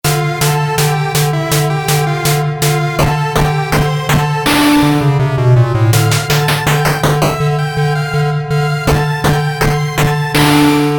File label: Beepbox